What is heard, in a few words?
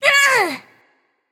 grunt hit; cerberus